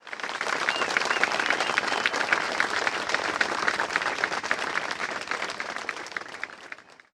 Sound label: golf clap